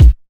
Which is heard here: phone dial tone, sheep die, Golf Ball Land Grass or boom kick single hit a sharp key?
boom kick single hit a sharp key